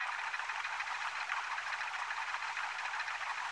Spin; Wealth Gods2

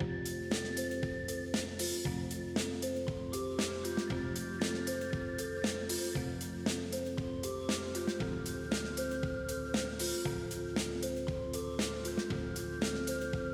Keno Music

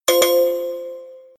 NORMAL WIN, Keno

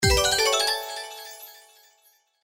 Win Scatter; St Paddys Day Blast

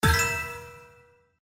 SFX Win; slots; Kungfu Showdown

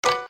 Spin Stop Win; slots; The Lucky Fairy

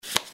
Card Flip; slots; House Of Luck

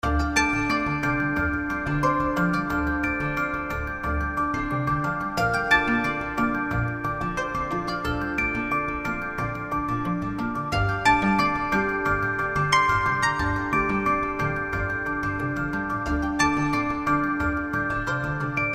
BGM FREESPIN; Zodiac Millionaire

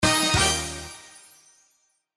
Symbo Win Bonus; slots; House Of Luck

